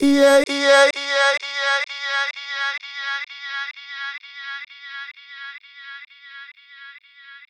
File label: Male Effected, Effected Vocals; Hip-Hop and RnB Vocals